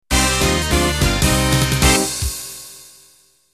Quickstep end; Ending #2

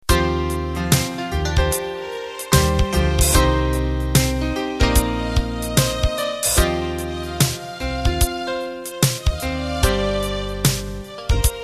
Swing Rock Intro

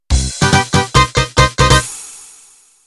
Quebradita End

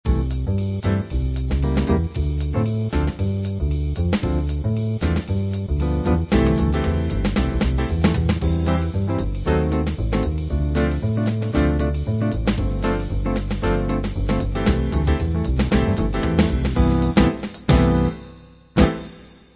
bop

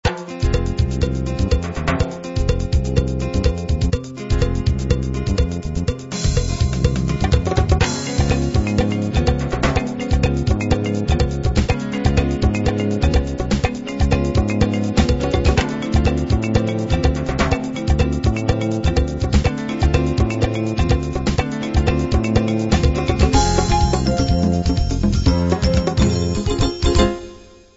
latin jazz

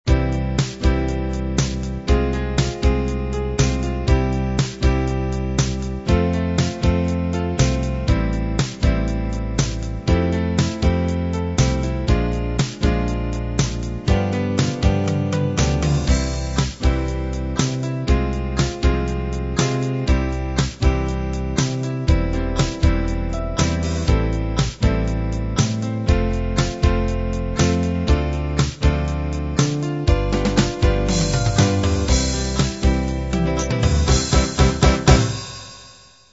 half 8-16beat

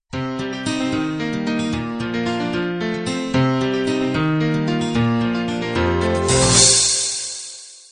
celtic ballad intro

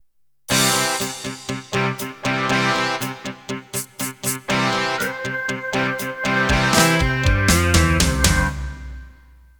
Open Rock Intro

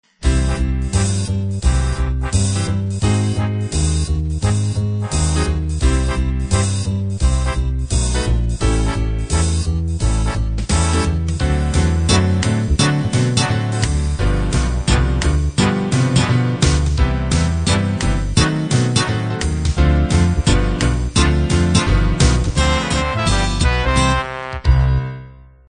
up swing

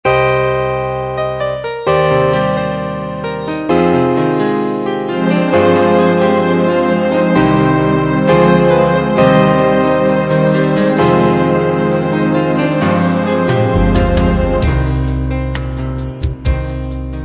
R-B Ballad excerpt

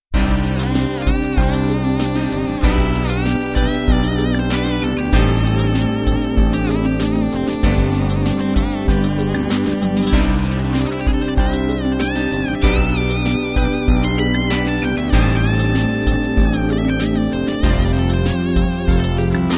16 beat analog end

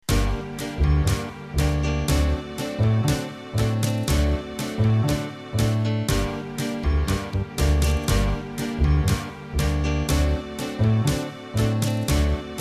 Tango Ballroom

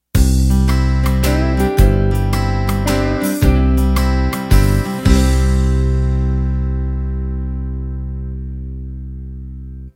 Country 3-4 End

